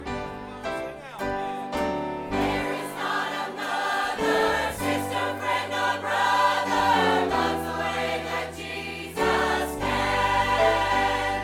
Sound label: his love choir